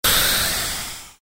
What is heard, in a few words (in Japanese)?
ミサイル発射